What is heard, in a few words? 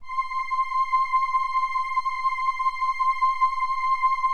LAMBDA, String Machines